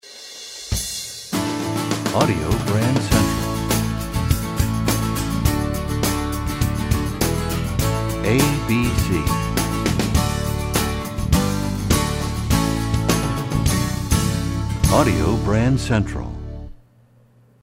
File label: Desert Wind, radio jingles